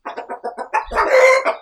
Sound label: Idle, Chimken; TheStable